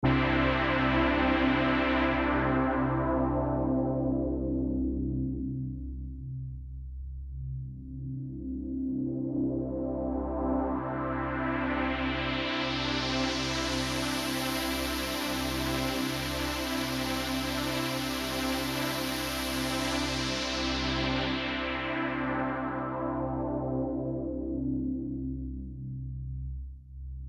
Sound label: Reason Maelstrom, 24 db oct Sweeps; mutator demos